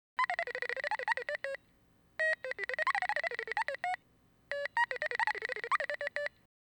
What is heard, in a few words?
piezo fancy